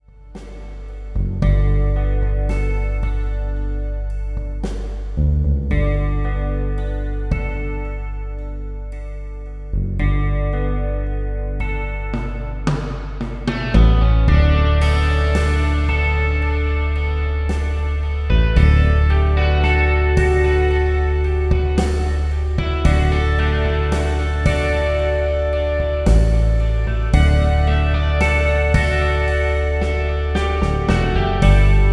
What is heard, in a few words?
acoustic